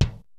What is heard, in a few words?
KICK SNARE; Kid Nepro; Elite; KICKS SNARES